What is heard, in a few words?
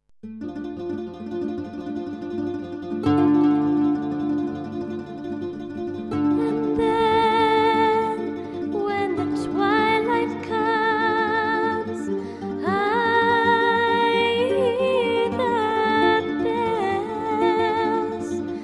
Twilight Bells